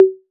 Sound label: TOMS 808